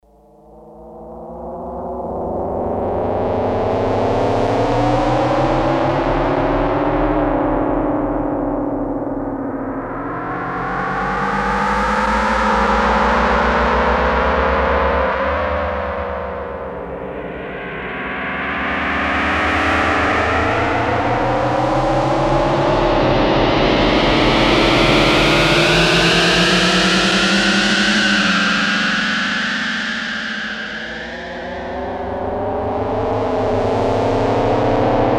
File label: Korg DS-8; Factory Patches; 'Cycles